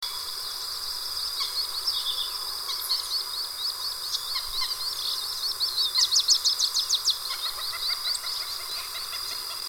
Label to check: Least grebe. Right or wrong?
Right